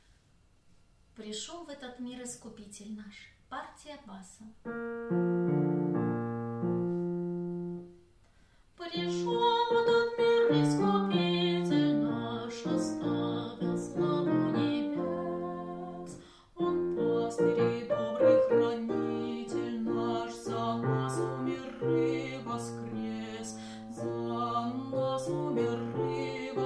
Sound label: bas; epiphany; Prishyol v ehtot mir iskupitel